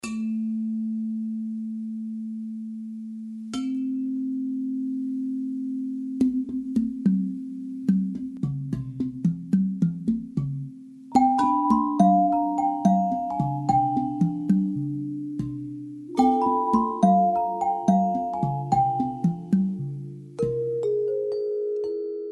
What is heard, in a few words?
Bar Perc Collage